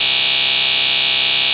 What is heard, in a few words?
noise